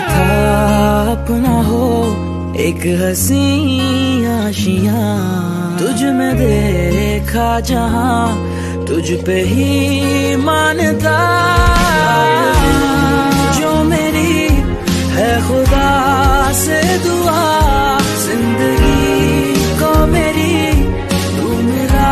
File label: bollywood ringtone; bollywood ringtones